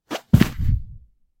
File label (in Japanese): ソファに勢いよく座る音; sofa